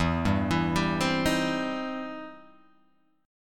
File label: E Minor Major 7th Sharp 5th, Guitar, Open G; 2,0,2,0,1,1; down